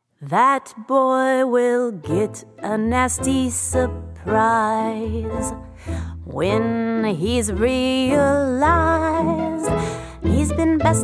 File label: Witch Song